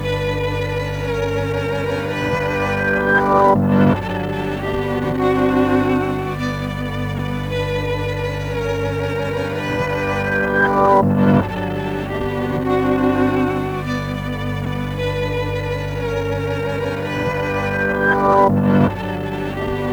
tape loop

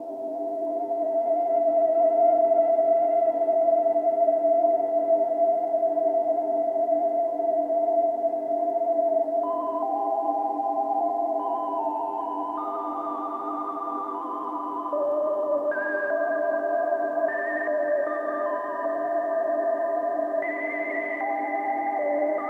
a tape walk

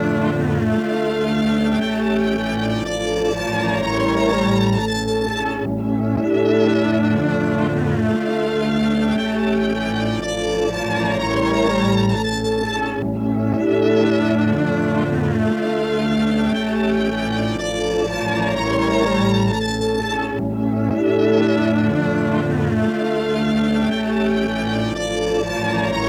tape loop

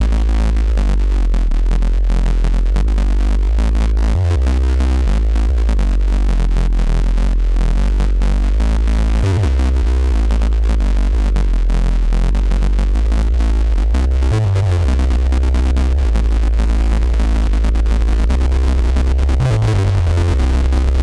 bass

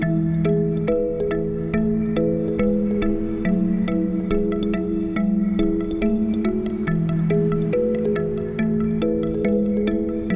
beach vibes

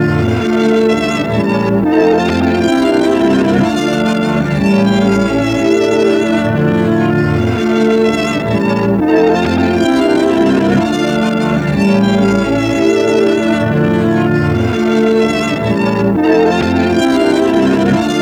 tape loop

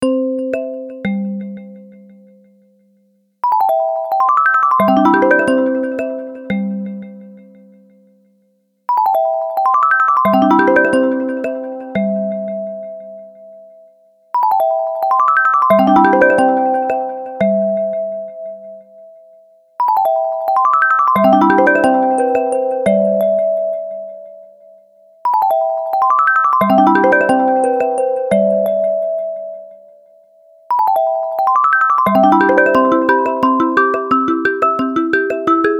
bell

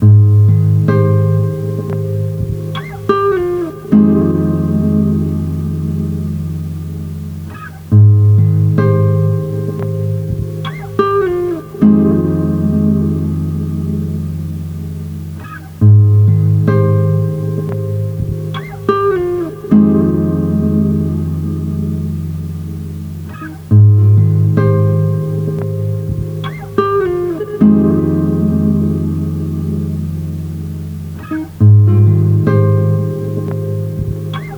nylon

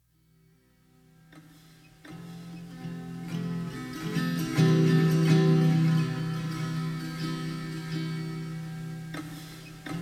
guitar

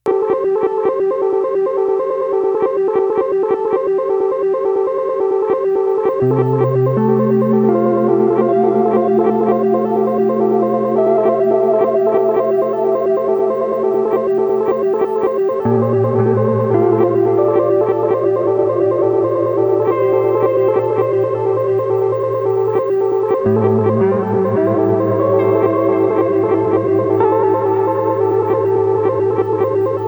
some guitar

